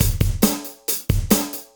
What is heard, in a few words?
CB LOOP; Zero-G; Chemical Beats